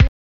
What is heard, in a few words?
KICKS; Zero-G; Funky Elements